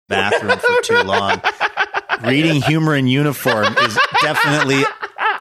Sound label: having a good laugh